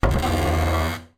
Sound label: household wooden chair drag solid tiled floor